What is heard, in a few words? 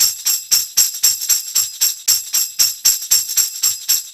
TAMB, Roots Disco; USB Soundscan vol.32; AKAI